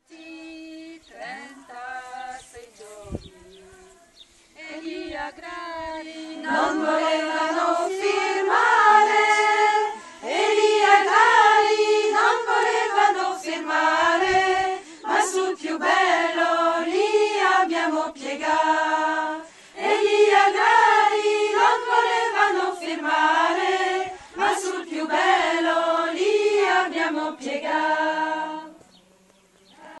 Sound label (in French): e partita soprane, voix